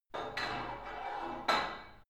put light weight onto barbell soft reverby, fitnessstudio; sport freizeit